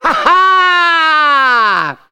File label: ha ha